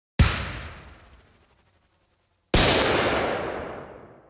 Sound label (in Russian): minomet